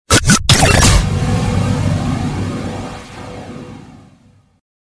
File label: Galery Noise; Sound Ideas - Galery Noise 002